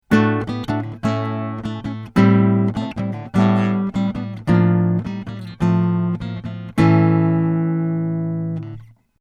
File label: Takamine 135SC Slashchord